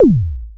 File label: Kick Small, Cwejman S1